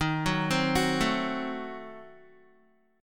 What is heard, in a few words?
Eb Diminished 7th Eb, Guitar; Ebdim7 arpeggiated; 11,9,10,11,9,x; JGuitar